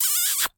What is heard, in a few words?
Caramel Click Sound; Jolly Battle